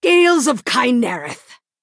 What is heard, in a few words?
attack; guildsworn cavalier